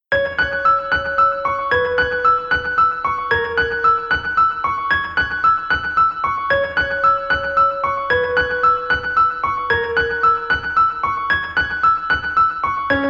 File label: piano; 先祖供養音楽; 先祖・故人の供養の為の音楽です。; 魔王魂ピアノ33